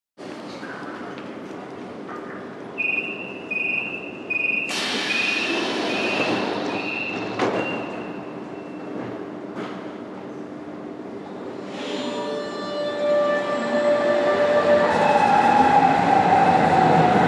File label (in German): S Bahn Abfahrt Bahnsteig